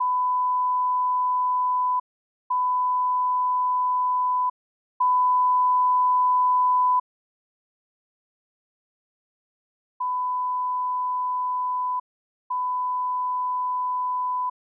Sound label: surround 5 1 channel test; speaker test